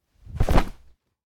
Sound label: Peel The Fur; LOOPERS